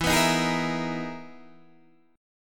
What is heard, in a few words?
E-Major Sharp 11th E, Guitar DADGAD